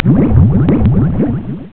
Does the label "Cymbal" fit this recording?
No